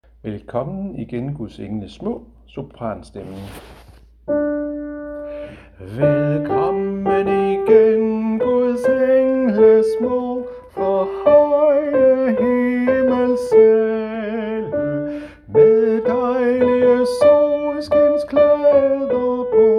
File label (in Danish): sopran; harlev koret; Velkommen igen